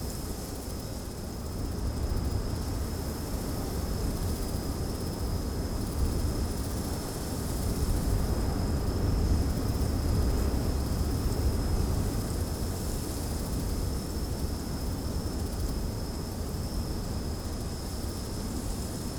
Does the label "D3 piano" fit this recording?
No